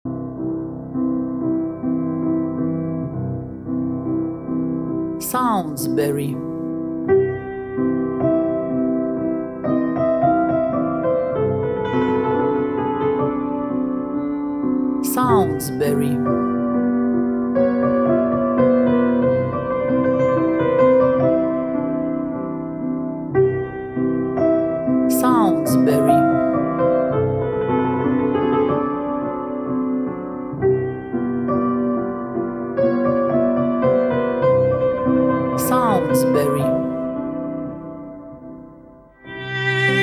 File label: Chamber Music